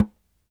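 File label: Small Frame Drum Mute, World Percussion One Shots; Sony CS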